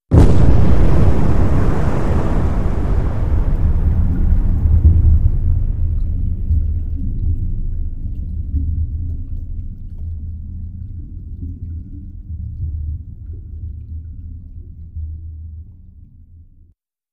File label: Underwater Explo, explosions